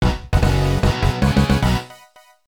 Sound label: Mission Over, Stage Clear; strikers 1945 ii; arcade